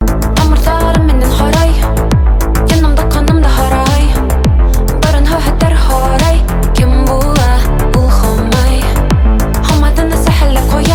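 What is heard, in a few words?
ringtone; rington na zvonok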